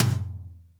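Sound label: tom, drums; Best Service; Real Mega Drums VOL-1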